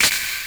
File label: Clap Crush Verb; Lost Tapes From Mars; Clap & Rim